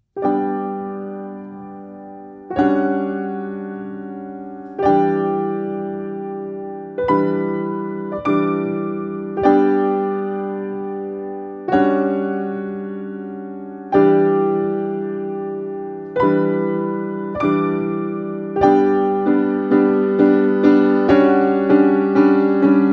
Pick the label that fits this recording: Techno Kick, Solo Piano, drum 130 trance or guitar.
Solo Piano